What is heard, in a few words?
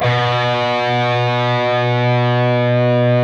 PRS C, Guitars, LEAD